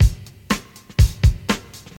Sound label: 121 bpm modern breakbeat sample a sharp key, Free breakbeat sample - kick tuned to the A# note.